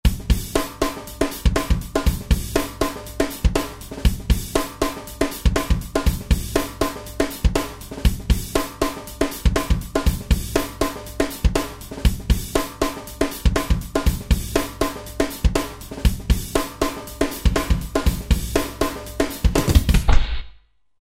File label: Funk 120; Roland SR-JV80-10 Bass & Drums